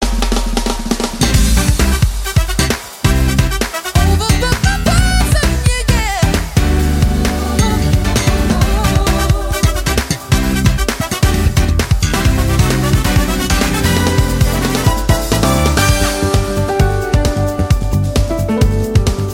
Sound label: Ringtones - Guitar Music; Samsung J7